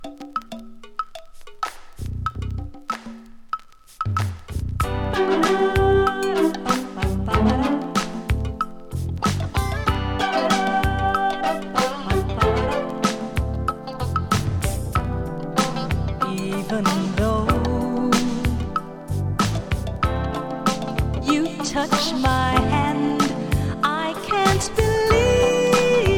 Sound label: Jamaica Modern Soul Stepper 80s Funk; JOLLY